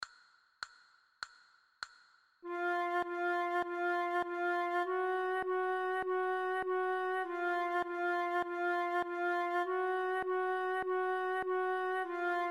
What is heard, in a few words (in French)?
Fa diese, flute a bec